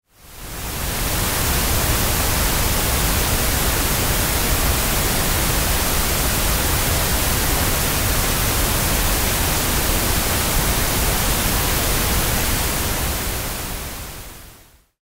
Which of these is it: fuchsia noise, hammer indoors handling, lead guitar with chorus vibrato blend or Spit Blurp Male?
fuchsia noise